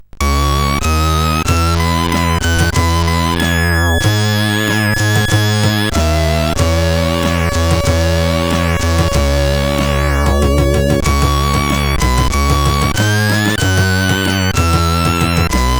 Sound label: Some Noise, sid CSG8580R5